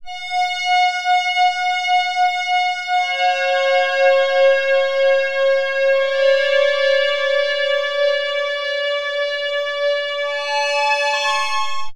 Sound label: PAD, Drum & Bass; Best Service ProSamples vol.03 - Drum & Bass 1; AKAI